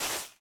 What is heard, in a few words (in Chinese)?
可疑的沙子放置声